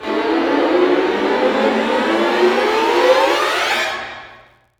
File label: STR SEC, STRING GLISS